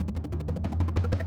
music school intro

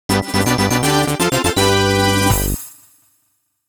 Get Item; Mario Party 3; N64

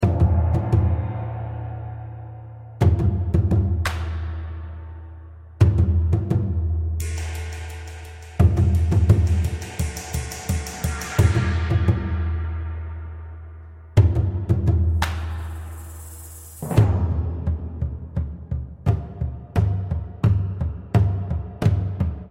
combatmusic; stage1; Might and Mayhem

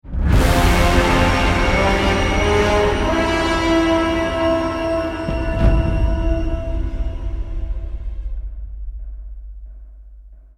Death; Marlow Briggs and the Mask of Death

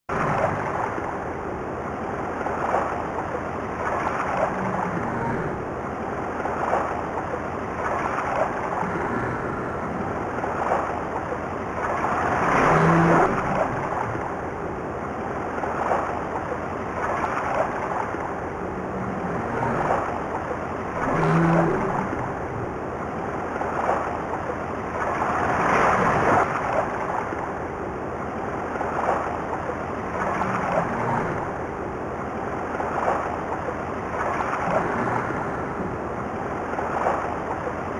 Stage Ambient Music; SNK Arcade Sound Digital Collection Vol. 18; Zantetsu

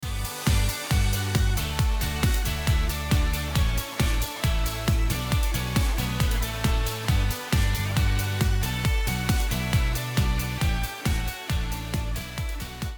MUSIC Band play; Equestria Girls

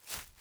foliage step; teardown ps5 windows xbox series xs gamerip 2022

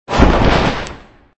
smash card

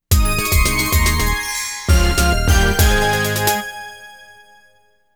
Victory Jingle; Tokimeki Memorial Taisen Tokkaedama Poitter's Point

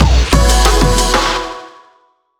MUSIC JINGLE LEVEL COMPLETE GOLD

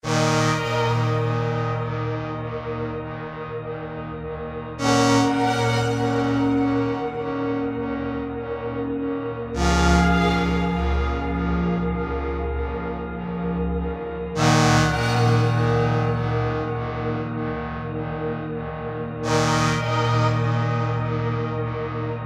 Moonrise A No Drums And Theme; Mind Scanners

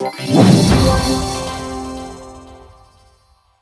Ui Victory; super sanctum td

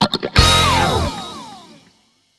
Jingle Timeup

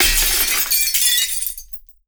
glass break

